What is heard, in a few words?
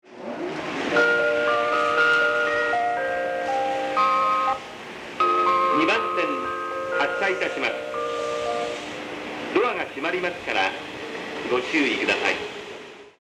melody, station